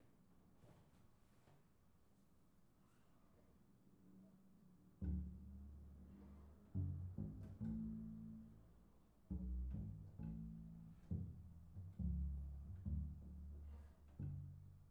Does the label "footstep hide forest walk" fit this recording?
No